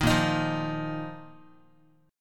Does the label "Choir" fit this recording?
No